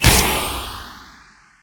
impact; smite; chiron

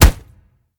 hit minion; smite; gilgamesh

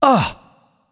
nv surprised; cernunnos

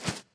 swing; smite; hades

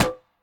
lance grab; smite; lancelot

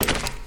movement run; smite; Arachne

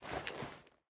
armor lite; smite; lancelot

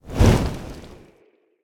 idle flap, phoenix; smite

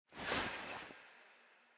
Clothes; smite; izanami

